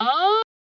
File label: formant filter vox hit d, Synthesized Voice Hits